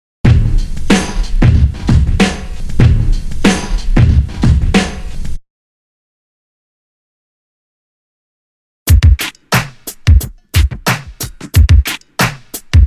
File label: Drum loop; BEST OF DRUM BREAKS 30